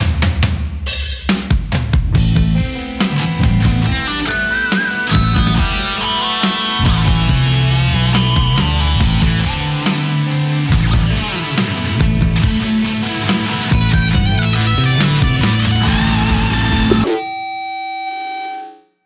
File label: Ballad